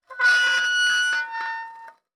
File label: Soldering Iron Stand Drag